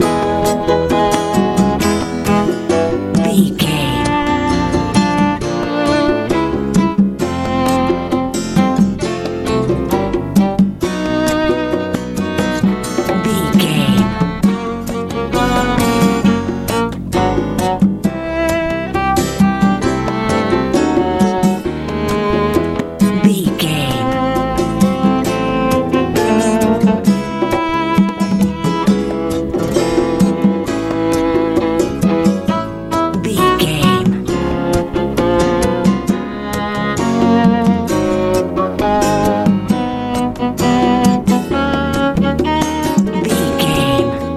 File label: easy pop